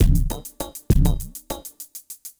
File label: drloop, drumloops, Vinyl Attack; Masterbits